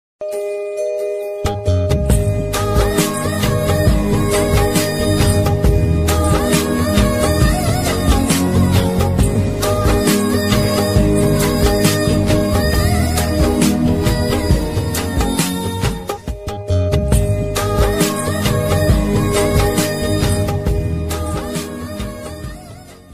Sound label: movie background music ringtone bgm; ekkadiki